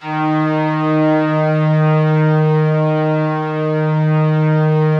D#2, STRINGS; Yamaha VP-1; Music Store; JODYSTRINGS

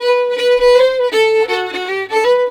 160 G violin, Celtic Flavours; USB Soundscan vol.42; AKAI

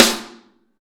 SN ambient; Best Service; Real Mega Drums VOL-1

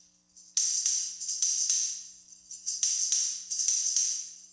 Garage Funk Tambourine, 70s Analog Funk; Sonic Foundry (Sony Creative Software); What it Is!

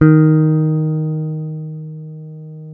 D#2 JAZZ GTR; Univers Sons; Jazzistic

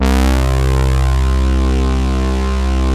SYNHORN; Greytsounds; Scott Peers Sound Engineering

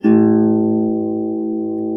A0 HARP; USB Soundscan vol.62; AKAI

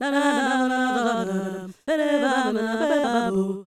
Fem Vocal 128bpm G FX, African Voices

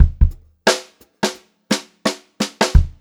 87 STR FILL, Funk-Groove Drumloops; USB Soundscan vol.38; AKAI

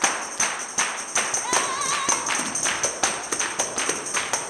160 TAMB BRK; Blues & Soul

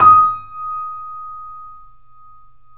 D#, The Piano; InVision Interactive

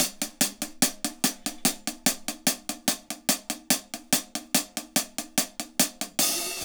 HAT, HI HAT LOOPS, Dub; Drumdrops In Dub VOL-1

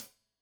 Hat Tight, Hi-Hat; Deeply Sampled Drums

